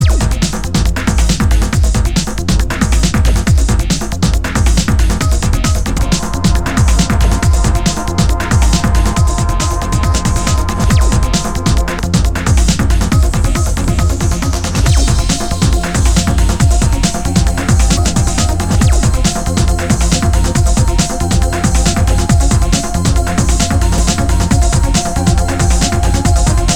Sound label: Breakbeat remix